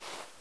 movement; czero opforce

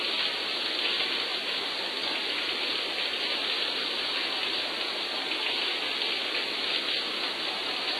interior, graves

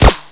elite fire; czero opforce

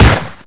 tec9; czero opforce

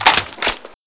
m4a1 clip in; csof

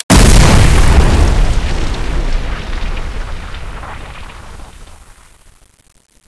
c4 explode; czero opforce

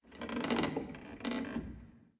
wood sfx; cstrike; mansion

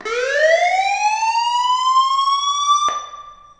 silo klaxon loop

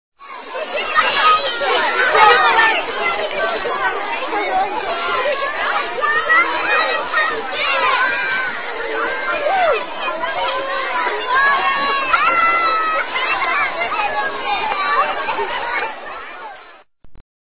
playground loop; de elkantara

